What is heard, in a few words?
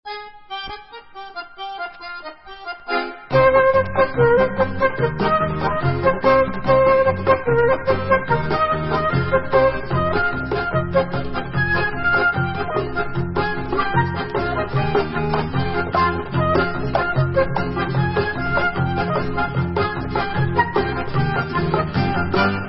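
Hora Instrumental, Israeli Dances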